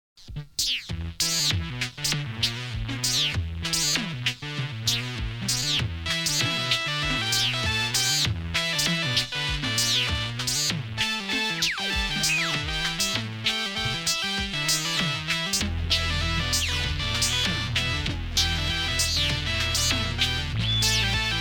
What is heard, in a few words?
Rap Session, instrumental